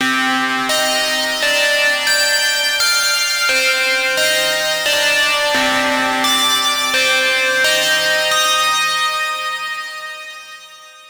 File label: Vibes, Drum n Bass; KJ Sawka; Drum n Bass & Beyond